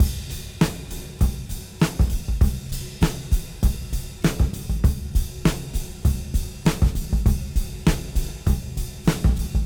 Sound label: Cym Beat 99 BPM Rev; AMG - Keith LeBlanc Phat Butt Jams